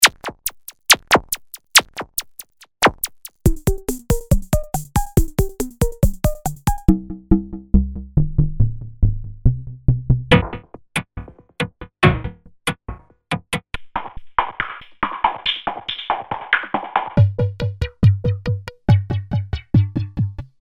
Electronic Perc, 140 bpm

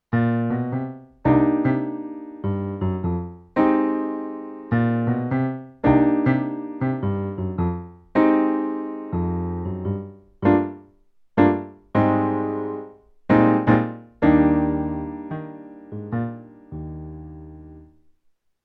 Alesis Recital 61 Bright Piano

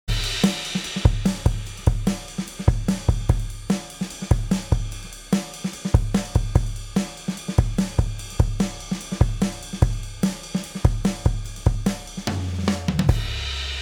Heritage Audio HA 609A Drumgroup Release

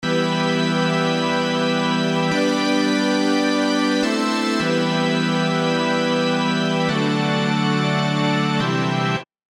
Synth Small Toy Room

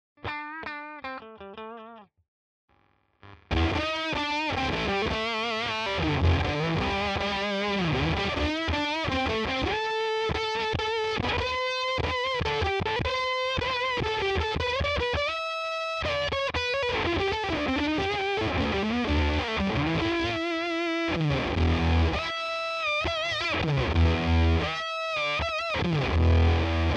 Riff; G full pre full honey

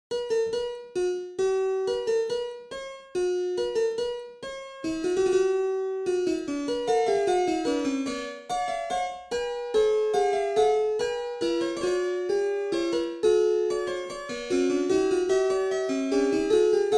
English Spinet

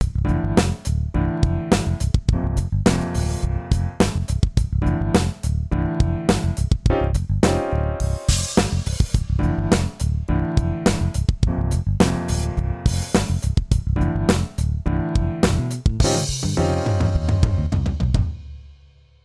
Heritage Audio Successor heavy fast

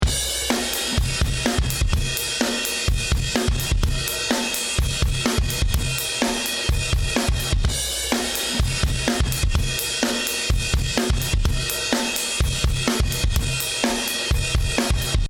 Drums 1176

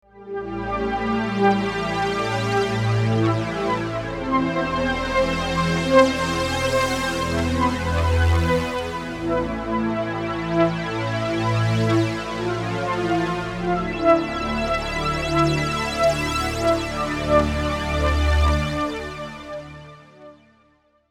Prophet VS

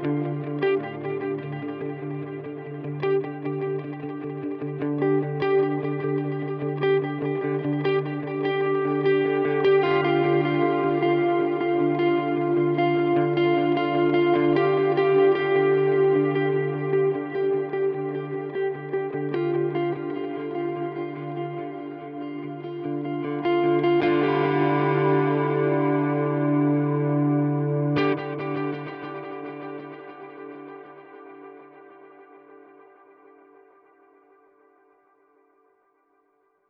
Reverb Magneto

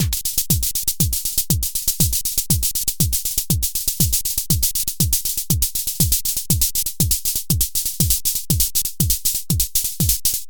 Novation Circuit Mono Station Swing

